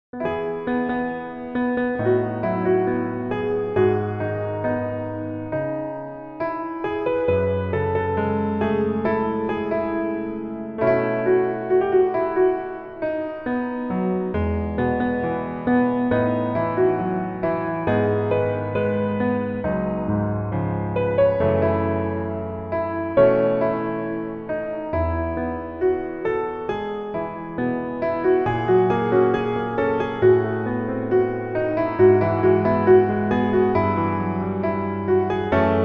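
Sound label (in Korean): Piano version 멜로디MR 남자키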